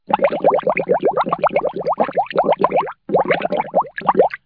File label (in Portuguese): bolhas; 7invasores